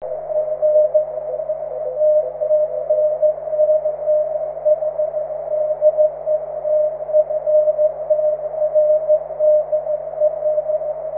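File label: continuous wave, 23cm; dl1ymk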